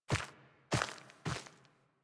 Steps, Forest Night End; Operator Lotus; Warframe; Sacrifice

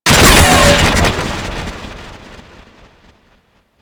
Space Through Portal; Warframe; Ambience; Space Battles

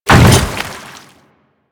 Hammer Boss Step Water, Footsteps

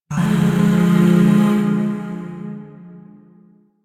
Shawzin Min Chord B; Music; Chords; Warframe; Zariman Void Shawzin; DE

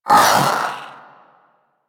Vocal Bark; Warframe; Pets; Zanuka; Zanuka Companion A

